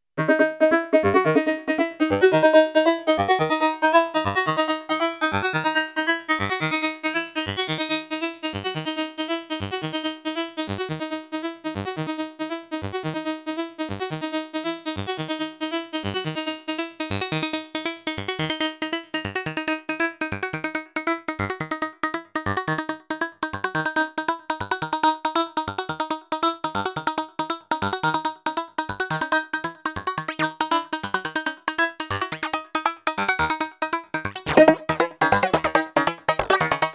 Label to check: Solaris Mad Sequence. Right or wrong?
Right